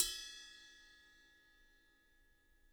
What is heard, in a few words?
RIDE 2 BL MF L, Interactive Drum Kits; Sonic Reality